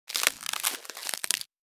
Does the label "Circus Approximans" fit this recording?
No